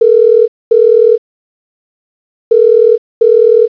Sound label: ring; LTspice IV